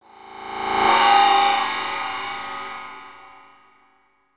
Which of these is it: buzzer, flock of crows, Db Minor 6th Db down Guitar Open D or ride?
ride